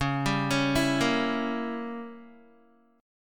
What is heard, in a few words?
Db Diminished 7th Db down Guitar; arpeggiated; JGuitar